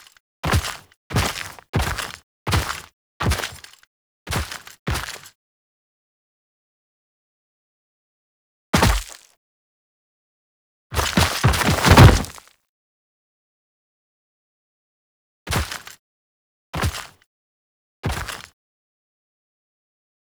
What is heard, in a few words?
Camp Foley Steps; Quests; The New War; Grineer Eidolon